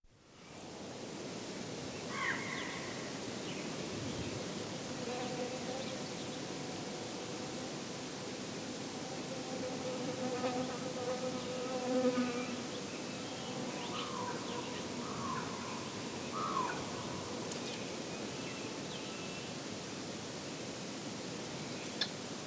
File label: Summer Walk In a Canyon; Dr. Sound Effects; 210 Nature Sounds - 20 Hours of Relaxing Natural Ambiences for Meditation and Sleep